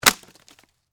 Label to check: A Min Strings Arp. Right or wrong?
Wrong